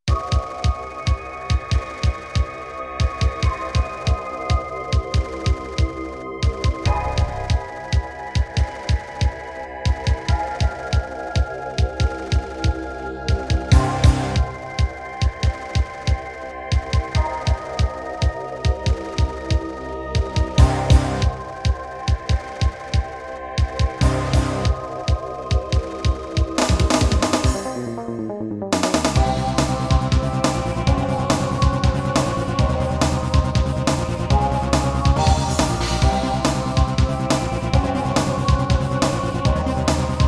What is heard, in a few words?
Roland SD-90 GM2MODE; sigil doom episode 5